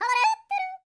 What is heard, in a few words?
YODEL, World Voices; ILIO; Vocal Planet